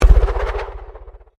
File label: Convolution Pulse